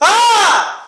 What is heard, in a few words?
Man Scream